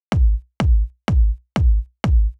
Kick Lag Orig

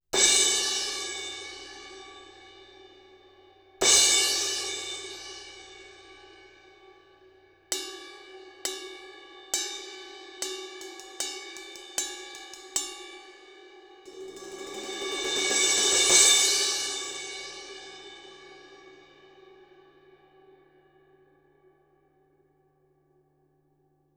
Cymb Crash Close; Close Mid

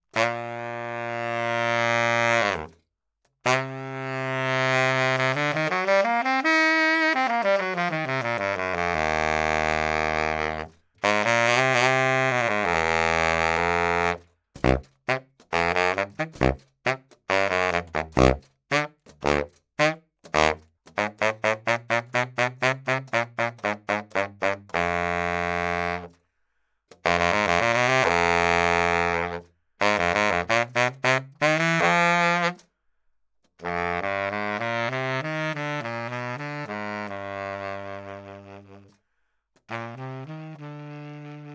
Sax Baritone 20cm Low Mid Bell

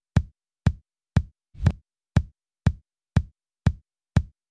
Kick No Sub

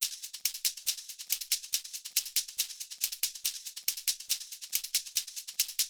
Shaker Gate

